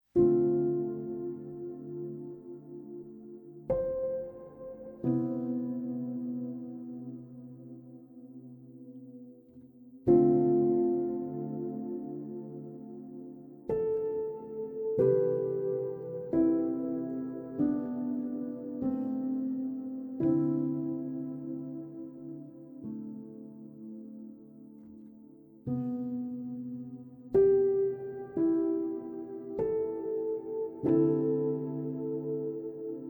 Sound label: Spa Music